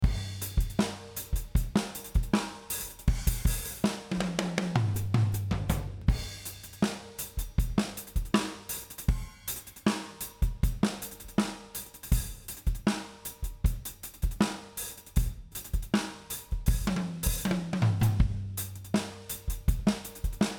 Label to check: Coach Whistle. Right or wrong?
Wrong